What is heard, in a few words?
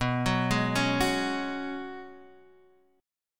Gb Suspended 2nd Suspended 4th B